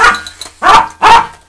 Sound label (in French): ouaf